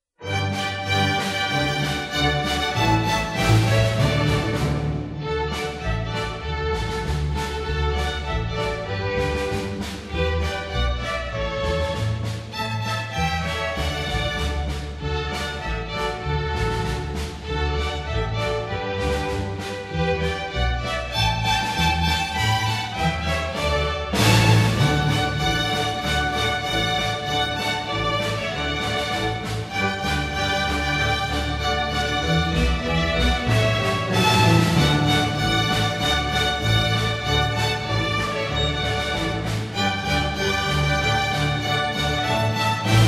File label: Jingle Bells, String Orchestra